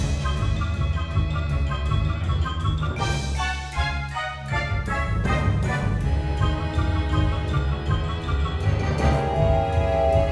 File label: 4 4 160bpm; Don't Starve Giant Edition; PS Vita